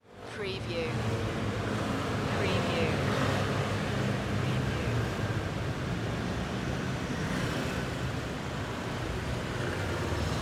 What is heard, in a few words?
Italy Street Traffic; Sound Effects Bible